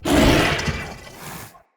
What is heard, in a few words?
Corrupted Battle Dog Attack Charge; Animals; Vocals